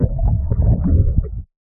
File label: underwater bubbles; soundscrate